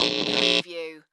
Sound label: Electric Zap